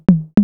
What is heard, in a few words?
TOM; Best Service Gigapack 2